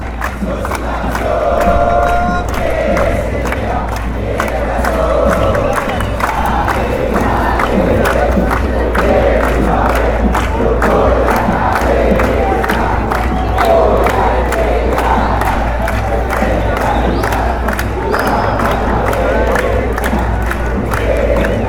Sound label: deportivo cali chant